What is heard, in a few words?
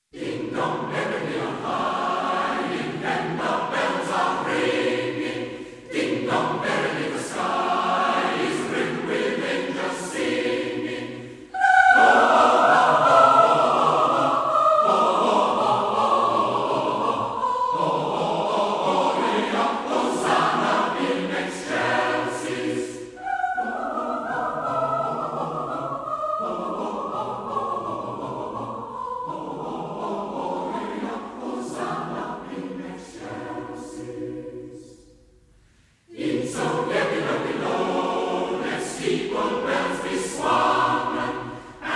Choir, Ding Dong! Merrily On High!; 圣诞节Christmas